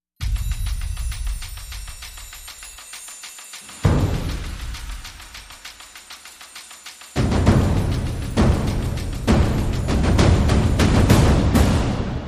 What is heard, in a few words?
Percussion Only